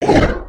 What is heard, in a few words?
run, creatures organic